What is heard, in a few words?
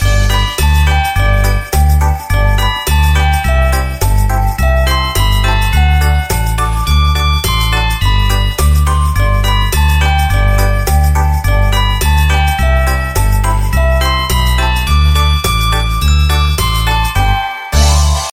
Xmas Sound